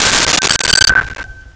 Glasses Hit and Ring; Crashes; 1001 Sound Effects

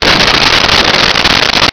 crash metal med

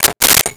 Rotary Telephone Dial; Communications

